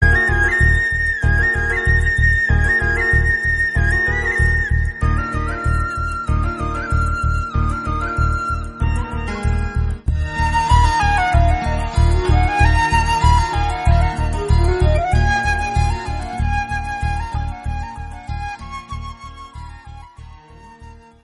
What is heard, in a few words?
bgm